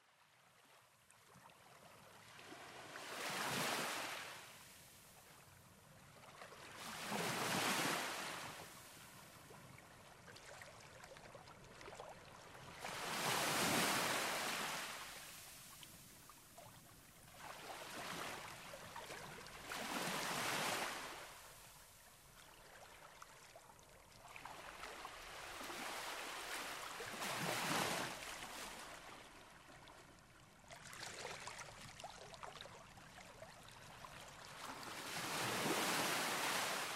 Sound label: Ocean; CandleShore